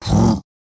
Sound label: Minotaur Attack Vocals